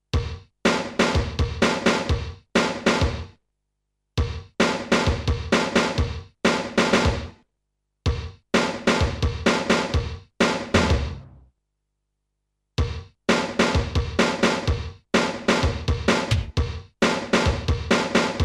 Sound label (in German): Roland S-330 Funkdrums